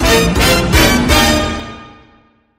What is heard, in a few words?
suspense